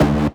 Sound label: Synths D; vengeance essential club sounds-3